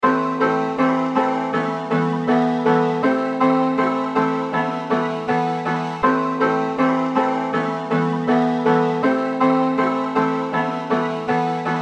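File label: A minor 160 BPM Piano Keys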